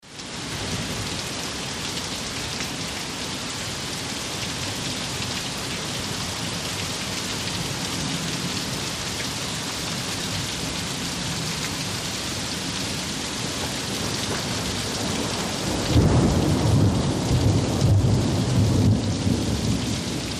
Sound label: Tropical Rain Wetter, ext